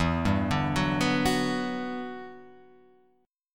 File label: E Minor 9th, down